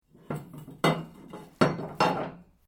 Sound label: metal rods move